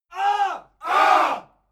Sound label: military shout ah